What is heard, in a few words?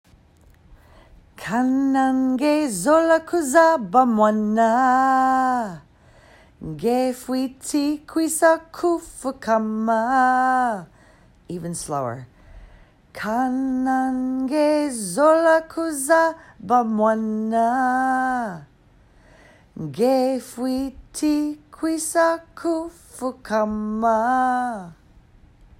african phrases